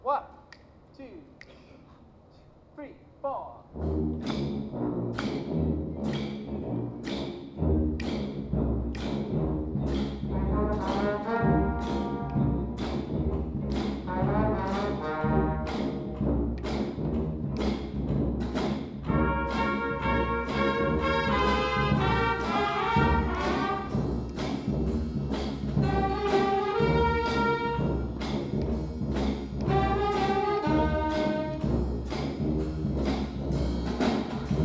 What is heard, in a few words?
Cadence